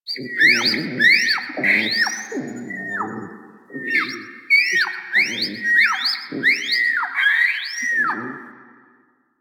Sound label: Drifter Camp Valley Water Creature; Warframe; Quests; The New War